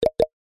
multimedia notification pop message tooltip small double tap